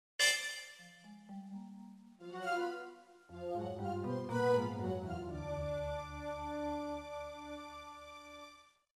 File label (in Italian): tensione